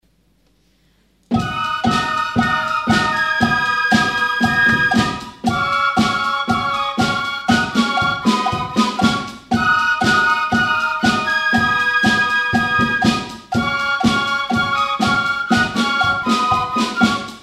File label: Farmhouse Rock